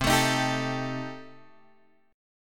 C Minor 6th C Guitar Drop D

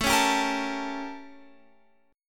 A 7th Flat 9th Guitar Open F; A7b9; strummed

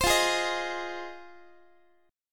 F Minor 7th F Guitar; JGuitar

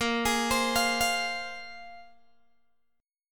Bb 7th Suspended 2nd Bb, Guitar Open G

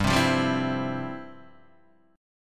F Major 7th Suspended 2nd

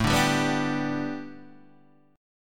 A 7th Suspended 2nd Suspended 4th Guitar Standard

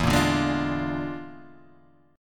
E 7th Flat 5th Flat 9th; JGuitar

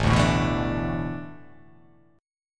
A Suspended 2nd Suspended 4th; Asus2sus4 strummed

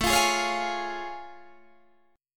A Augmented Major 7th A Guitar DADGAD

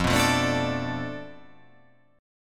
F 6th Flat 5th F down, chord

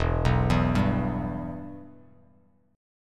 E-Minor; arpeggiated; JGuitar

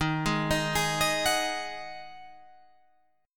Eb Minor Double Flat 5th Eb Guitar Open G

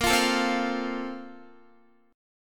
Bb Major 7th Suspended 2nd, Guitar

